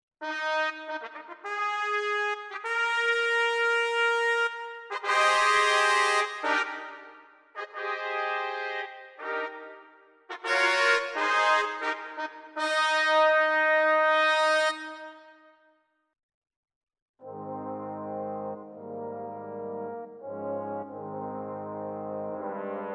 Genos Sect Brass

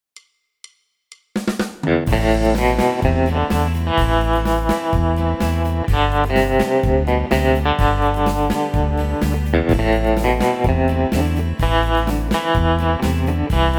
Trem

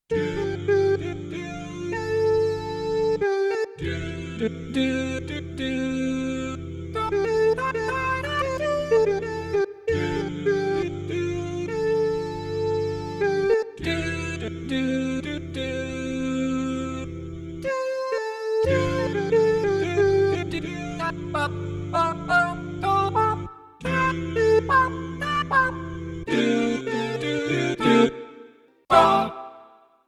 Scat Voices

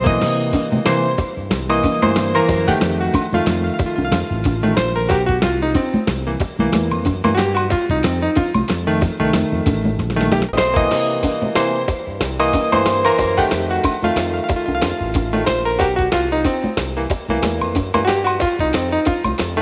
Full Mix

